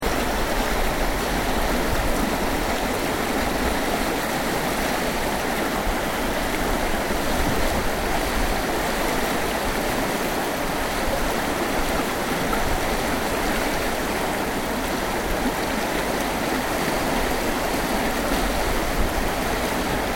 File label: Water River Fast Loop; Elemental weather effects; Nature; Foundation4